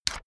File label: notepad sheet tear off fast